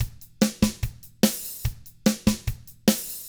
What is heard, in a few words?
drums, 50s rock; ILIO; Double Platinum Drums 1